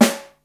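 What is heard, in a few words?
snare march, Yamaha Motif ES drum machine; 300 Drum Machines; Yamaha Motif ES-Series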